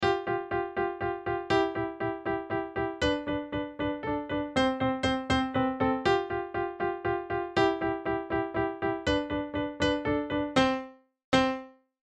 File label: Cartoon Piano Chopsticks Musical; Cartoons; Blastwave